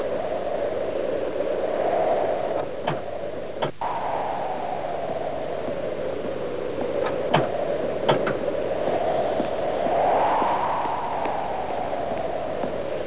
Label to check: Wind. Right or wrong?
Right